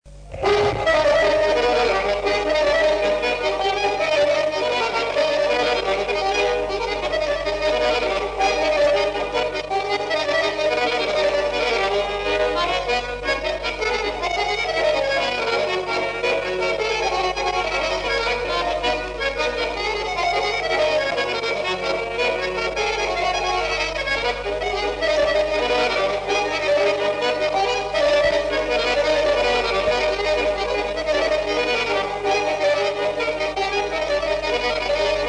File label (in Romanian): Batraneasca la acordeon; jocuri si cantece batranesti; Vaslui